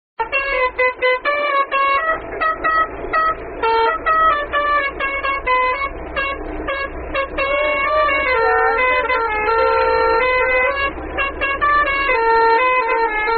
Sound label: Punjabi Truck Horn